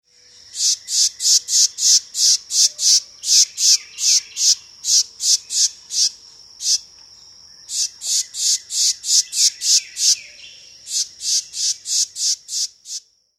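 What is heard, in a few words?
Carolina wren alarm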